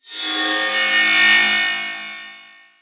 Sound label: metal saw, zaps and beeps